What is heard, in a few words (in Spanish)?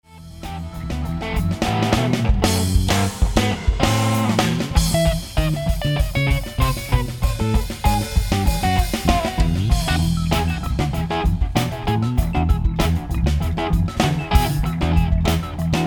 Instrumentos